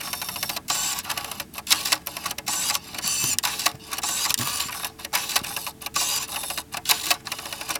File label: incoming Sound; ROBOTS; assembly